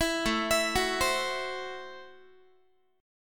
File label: A Minor 6th A Guitar; 19,17,19,16,16,x; arpeggiated; Am6